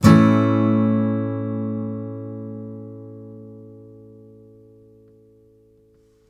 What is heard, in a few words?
MAJ A, Blues Guitar; ILIO; Fretworks